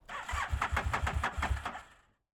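motorcycle aprilia sl1000 falco exterior false start ignition issue mono; ntg3; Sonniss GDC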